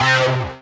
synth C3; carmen

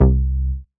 Bass C

